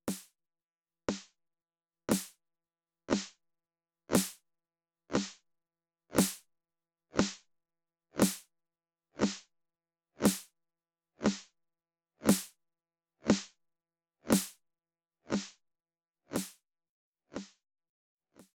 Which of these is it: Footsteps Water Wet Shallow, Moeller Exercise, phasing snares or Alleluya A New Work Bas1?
phasing snares